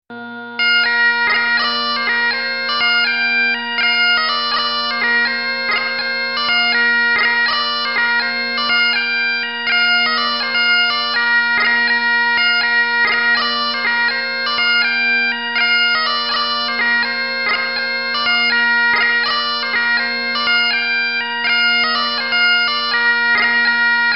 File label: Birthday March